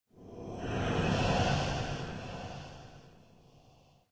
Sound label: cemetery; portlux